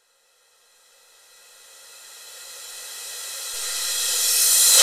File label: Reverse Cymbals